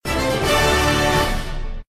fanfare; Book Of Ra DX6

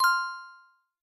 increment stop; Hot Spin Deluxe

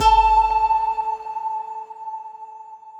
prize normal; Great Book Of Magic Deluxe; Wazdan

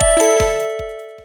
freespin init; Grand Fruits

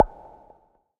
mouseclick

